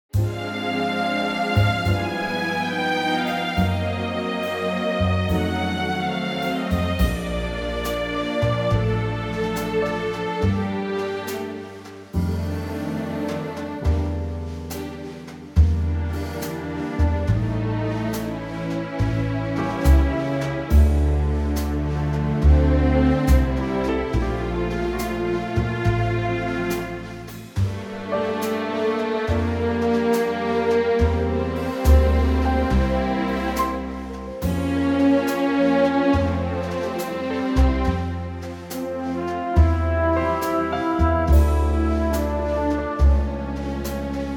orchestra

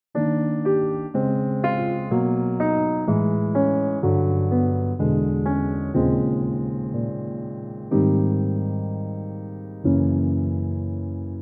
Ab Piano

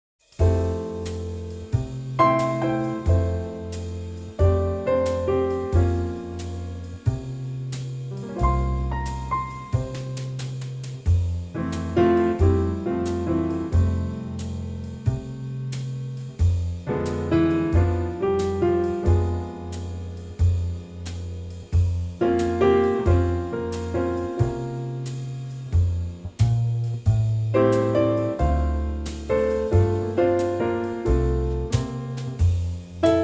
F, backing tracks for vocalists, no solos

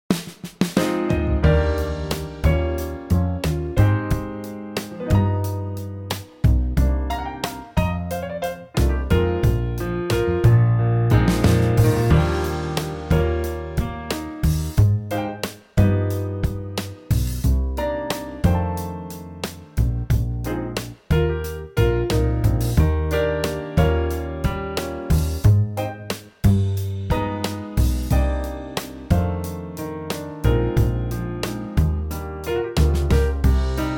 Trio Eb

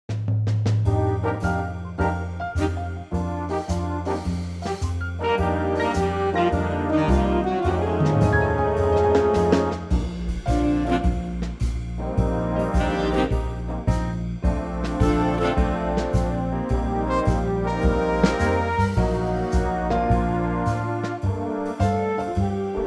Big Band Bb

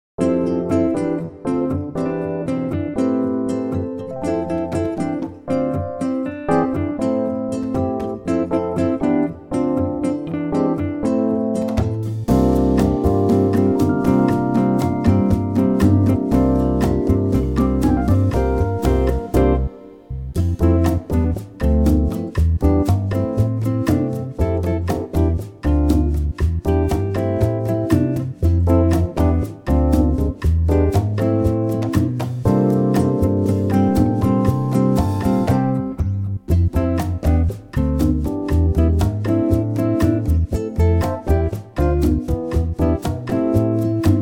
bossa C

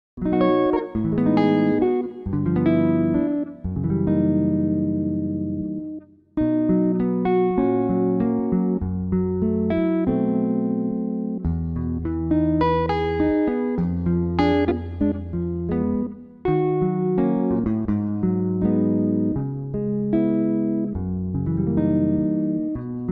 C w verse Gibson Guitar

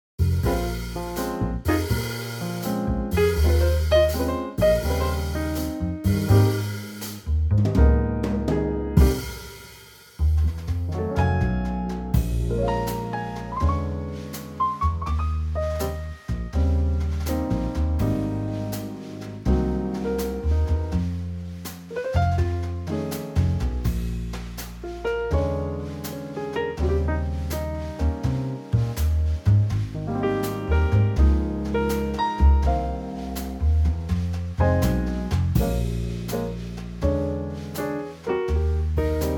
Ab Trio

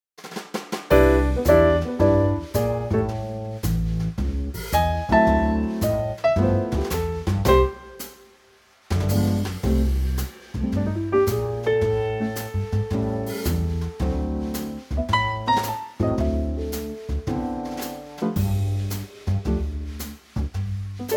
C Trio

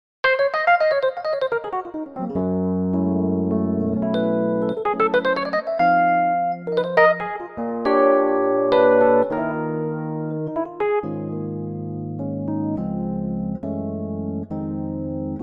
Db electric piano; fender rhodes

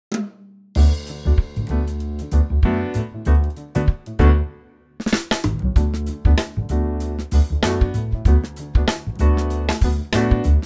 D Trio

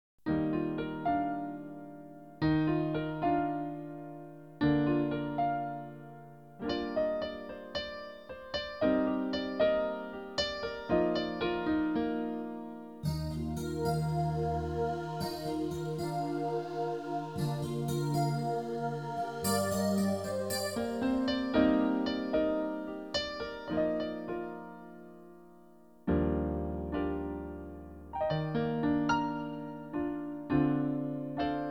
Bb dance; livetrak

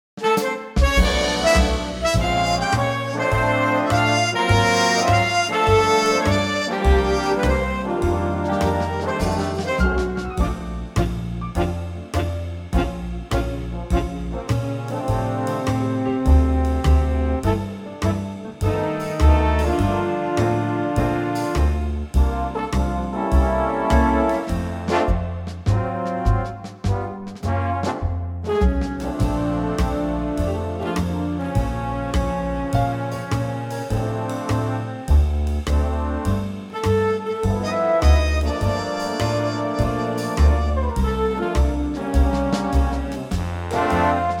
Big Band F

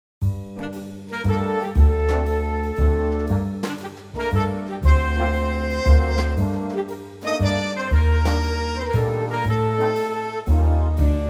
Big Band Gm

